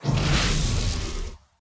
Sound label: Energy Orb